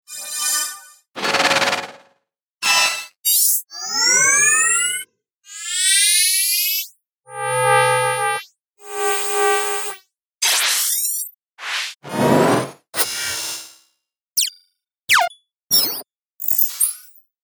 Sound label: Zooms